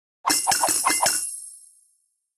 Piggybank Popup Falling Goldbars; candy crush saga gamerip

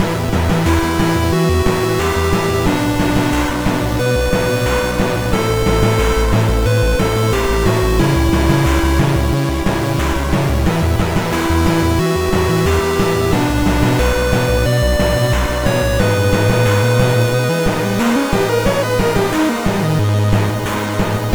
Boss Loop

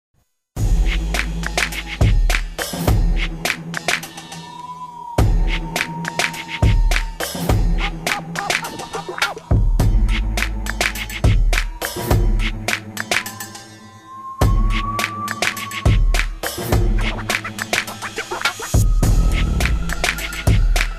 Koto; Def Jam Fight for NY

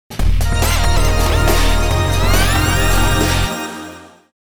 Triumph Jingle Win; carnage heart portable original sound track

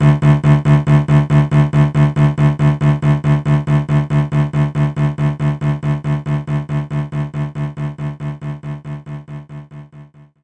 Cello Attack D1; knights try

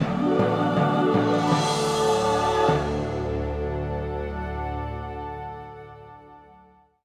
Win; Grim Adventures of Billy & Mandy; Wii

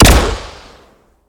Shot Medium Gun Mech Rifle; Robobeat

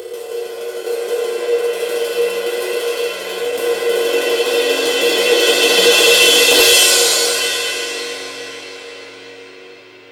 Ambient caves transition; song of iron

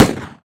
Weapon Pistol Shoot

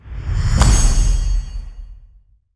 clapping; boss efreeti

